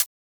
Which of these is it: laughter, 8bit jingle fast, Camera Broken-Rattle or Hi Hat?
Hi Hat